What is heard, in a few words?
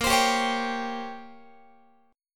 A sharp Minor 6th Add 9th A sharp down Guitar, chord; A#m6add9; strummed; x,18,15,17,17,18